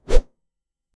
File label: sword swing slimy sound; game